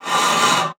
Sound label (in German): Luft; Xsample VOL-3; STACC+FX